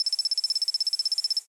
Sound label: digital typing; SoundsCrate